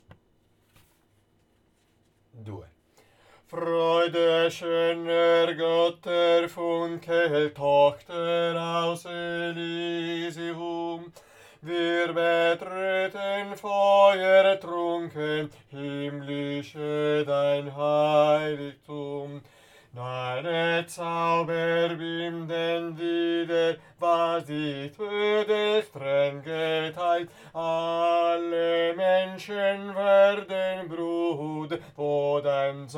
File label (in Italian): ALTO; inno alla gioia; cromatica